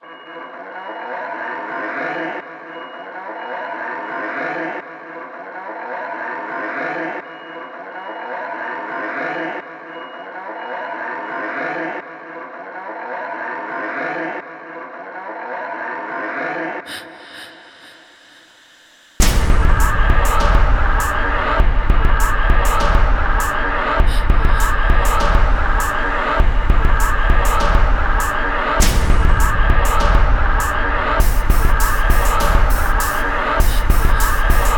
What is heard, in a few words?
Noir Trailer Music